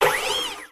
Servo High Little Start; Puddle; puddle plus ios gamerip 2018

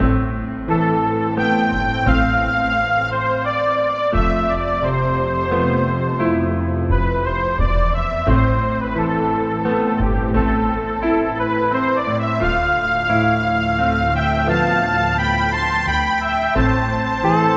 Situation Dramatic Loop

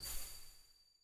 Land; Harmony Hermit

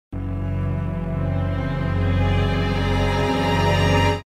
Sting; Bethnal; The Getaway; PS2

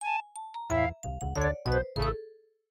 MIDI Animation stings; Carmen Sandiego Junior Detective Edition

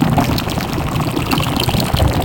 Corps Flesh Loop

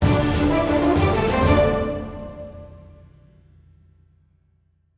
Music Fight Pirates Theme Hard Bpm128 End; asterix obelix slap them all 2

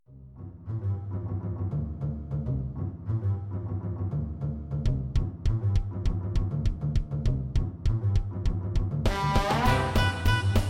music swing; Sentry Knight Tactics; grumpys swing

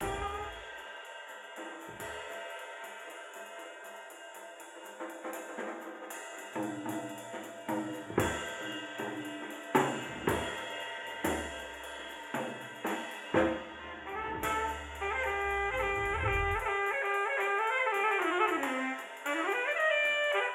Office Music; Puddle Plus

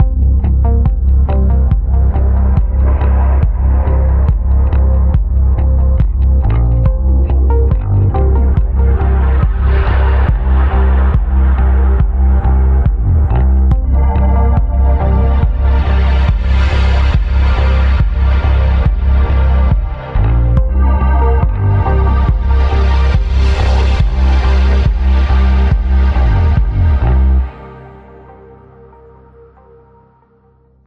Mus Grasslands Stem; Epitasis

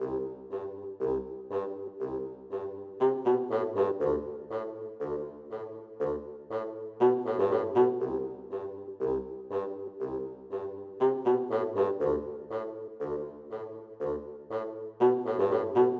Garden Loop Bass; BouncyBoi in Puzzle Land

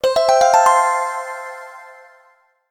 SFX - Reveal Item; Stumble Guys; Android

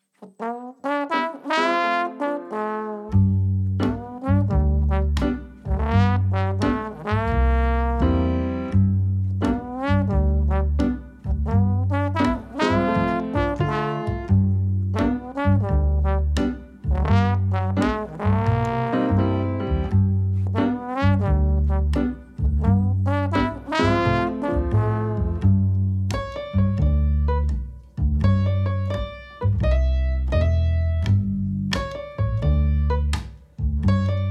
Bowling Machine music

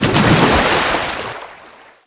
Hit water Splash

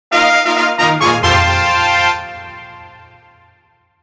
Jingle Lap Bonus; culdcept revolt original soundtrack dynamix 2017

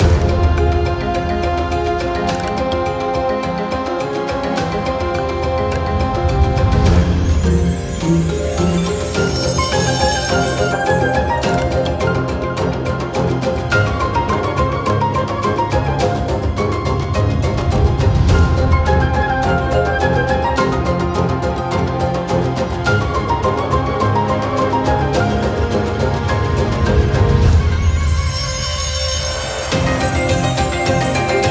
Loop

